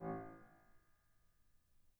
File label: harm A1, Grand